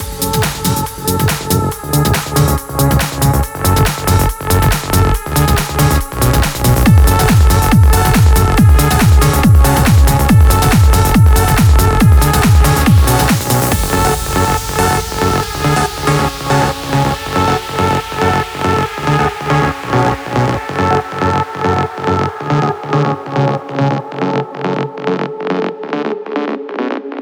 Lectrotrance Mix, 140BPM; Vengeance Samples

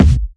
Bassdrum; Vengeance Samples; VEE Bassdrums

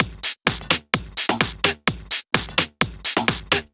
Loop, 128 BPM; VES2 Loops 128 BPM

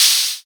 Cymbals HH Open; Vengeance Samples

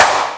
Clap; Vengeance Samples; VEC1 Claps

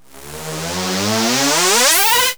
Sweeps Medium

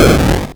Arcade Riffs

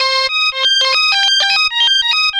Arp C Line, ARP Odyssey; musicradar-synth-samples

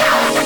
Synth Sound; Vengeance Samples; VEE Synth Shots

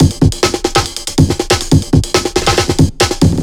Breakbeat 140 BPM

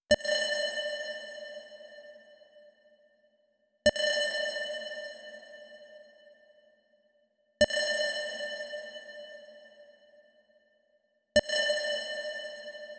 Synth Single Note, 128 BPM; Rap; Vengeance Samples; Vocal-Hook Kits; Song Kit

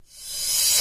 Cymbals Reverse Crash; Vengeance Samples

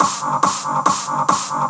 Loop 140 BPM; vengeance essential club sounds-3

